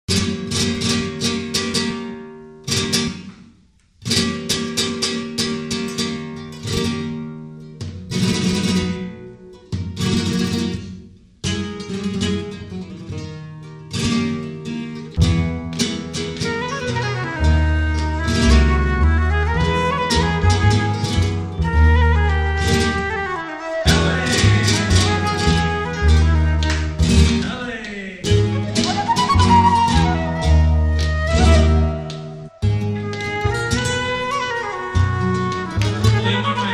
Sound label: tientos, flamenco flute